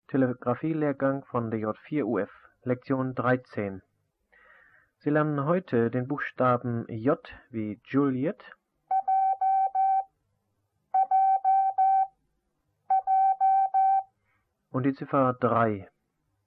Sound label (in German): Morsen; Onlinelehrgang